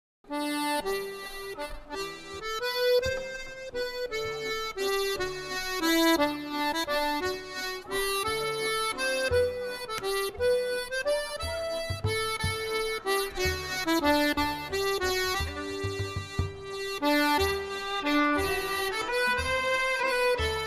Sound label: VALSES